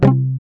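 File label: GUI C, acoustic guitar; Best Service Gigapack 2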